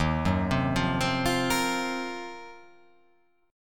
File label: Eb Minor 6th Add 9th, Ebm6add9 chord; arpeggiated